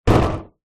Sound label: impacts plastic hollow unit impact drop